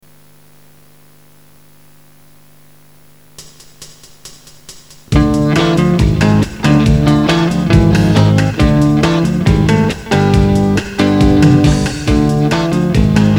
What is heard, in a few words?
instrumental